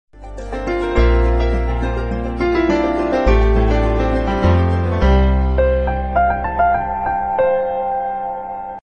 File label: telenor pakistani ringtone